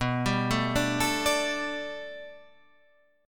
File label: A 7th Suspended 2nd Suspended 4th